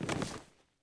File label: body Get Up wood